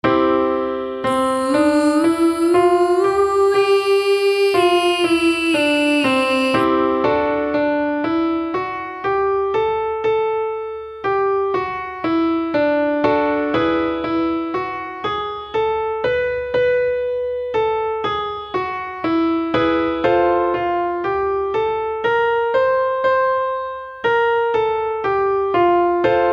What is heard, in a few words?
5 Note Sus 5th Oo Ee